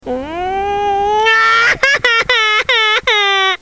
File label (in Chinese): 小孩哭